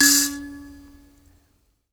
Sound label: PLK Bush Mbira; Roland LCDP11 Africa VOL-1; M'biras